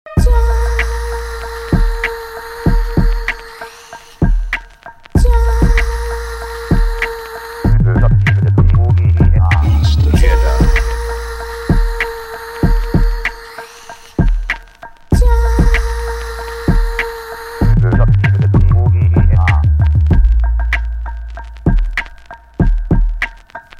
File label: instrumental